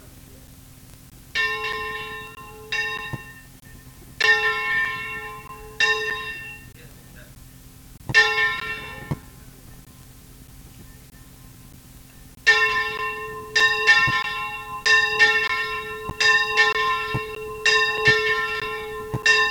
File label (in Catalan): campana